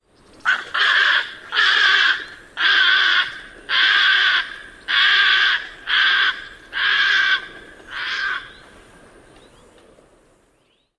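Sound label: Little Blue Heron flight calls alarm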